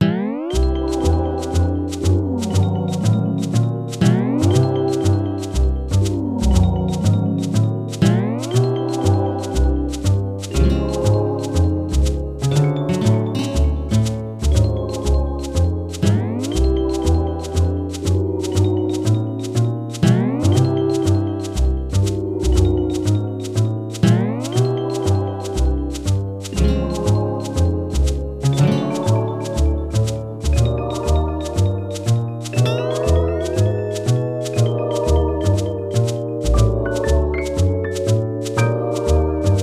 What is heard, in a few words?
Sirena Beach, Chiptune; Gamecube; Super Mario Sunshine